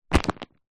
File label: household plastic pillow or duvet bag grab pick up